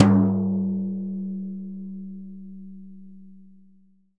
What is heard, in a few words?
TIMB BR LO H, Synclavier Percussion; Synclavier Percussion 2; World & Orchestral; ILIO